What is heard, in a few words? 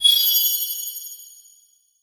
Magic Light Bubble